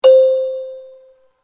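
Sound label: correct answer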